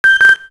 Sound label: Movie Stop, Camera; Motorola PEBL VU20